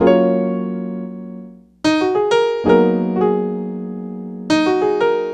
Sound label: Piano, Acoustic Pianos